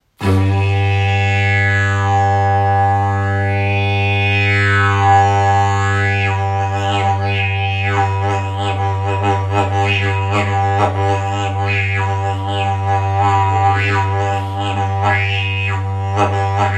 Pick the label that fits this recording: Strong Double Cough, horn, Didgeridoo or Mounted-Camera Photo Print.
Didgeridoo